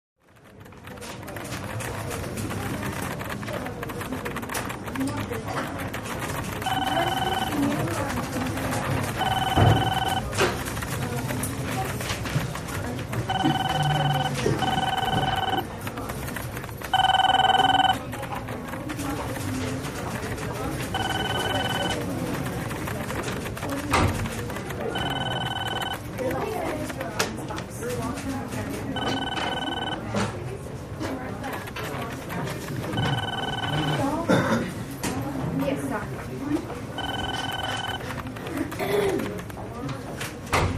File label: Office Interior, Machines & Voices In Distance, Close Up Modern Telephone Ring, Some Computer Typing, A Couple Of Doors Open and Close.